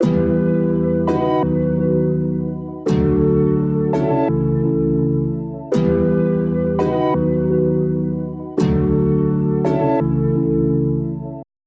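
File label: Bell 168bpm E minor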